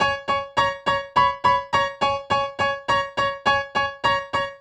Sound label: Acoustic Piano